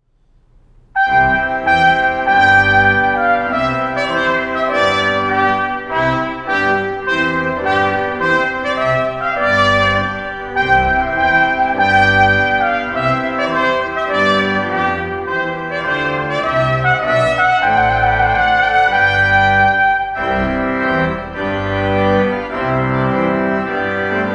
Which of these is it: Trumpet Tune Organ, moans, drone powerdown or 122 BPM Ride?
Trumpet Tune Organ